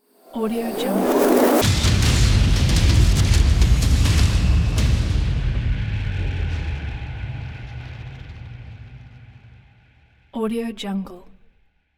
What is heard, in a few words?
incoming dramatic explosion impacts